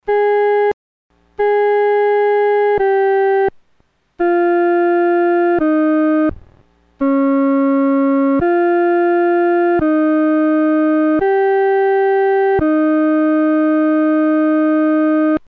独奏 第二声; 阿门颂（二）

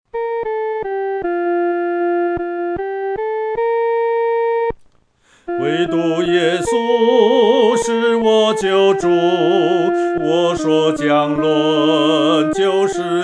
惟独耶稣 独唱（第一声）

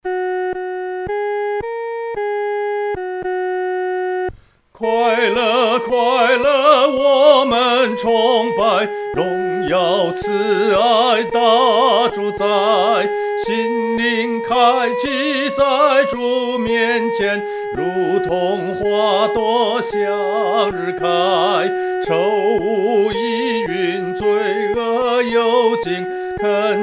独唱 第一声

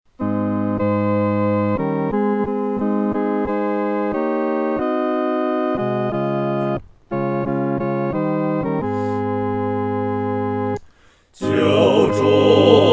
合唱 四声部

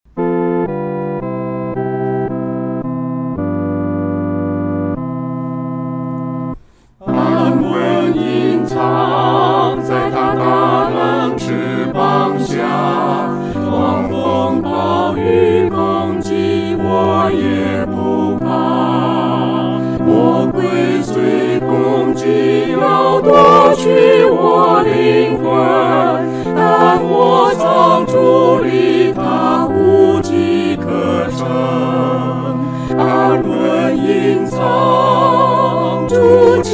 合唱 四声部; 主翅膀下

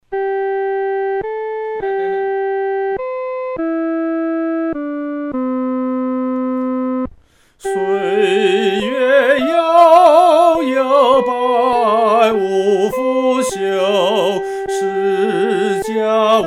独唱 第一声

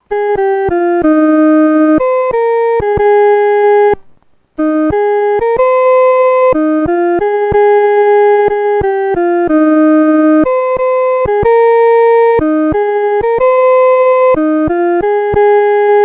独奏 第一声

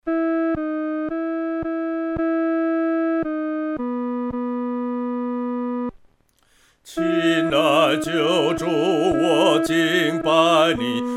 独唱 第二声; 荣耀是主圣名

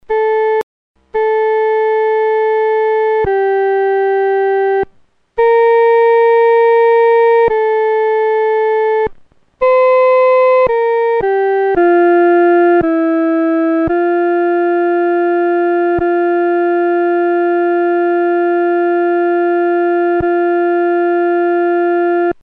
阿门颂 独奏（第一声）

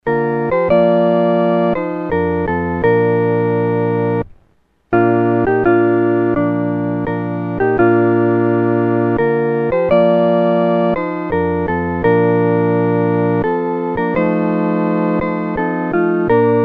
合奏（四声部）; 万古磐石

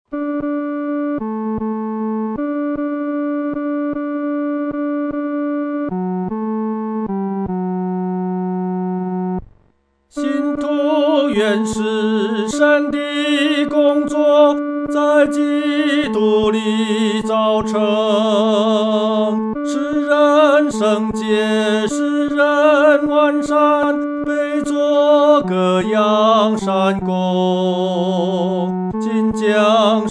独唱 第三声; 信徒原是神的工作

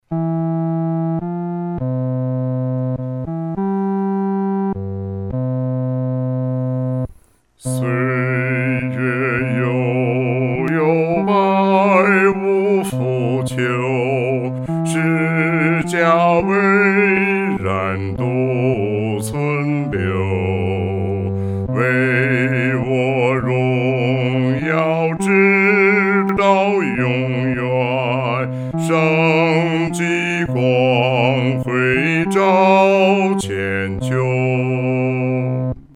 独唱 第四声

